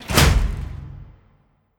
Sarco Slam

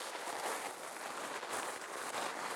Wall Slide; Hollow Knight; Hero Wall Slide

